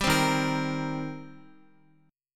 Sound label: Eb 6th Eb, Guitar; strummed; x,x,5,5,3,5; 4E,4A,5D,5G,5C,6F